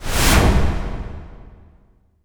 IMP WIPE, Cinematic Impact

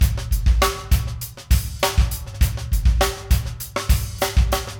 funky drums; AMG; Now CD-ROM (Roland); NOW from HELL!

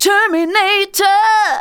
vocals sung; Techno Trance Essentials

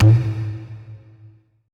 E TABLA A

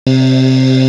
VOCAL 130BPM delay; SONIC ELEMNT; SONIC EL YES

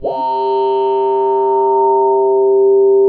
SYN, Drum & Bass, 160 BPM

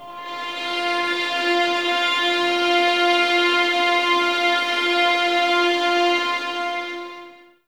VS F 6, String Ensembles, 11 Violins, Sul Ponte; Miroslav Vitous

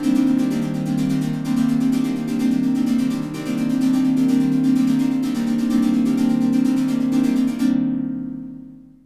127, SYNTH SEQ; AMG; Global Trance Mission VOL-2

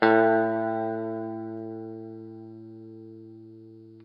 Guitar & Bass; Quantum Leap

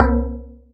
Synth Bells; Dream Zone

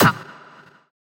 Fx Vox; Equipped Music; Loungin House

